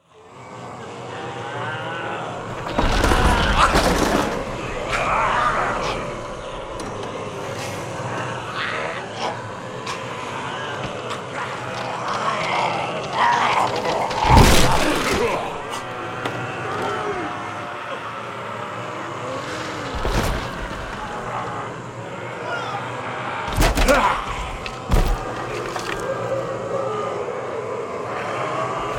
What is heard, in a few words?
ambient